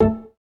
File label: windows default sound